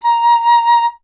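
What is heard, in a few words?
FLUTE PICCOLO A#; Miroslav Vitous - Solo Instruments VOL-2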